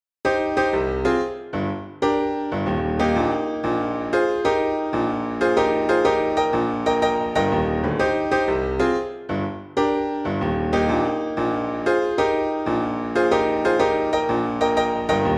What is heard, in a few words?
miniature in jazz, piano; pieces pour piano